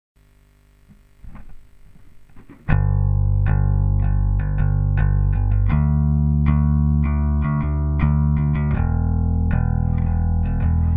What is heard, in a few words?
Backing Track; chord progressions mastery